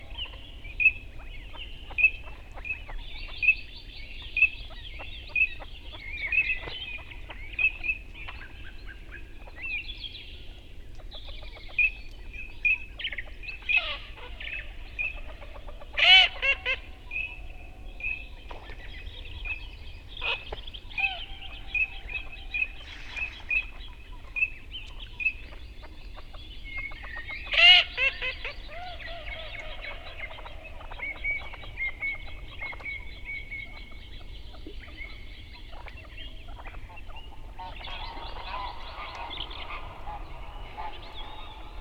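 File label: common teal anas crecca; utok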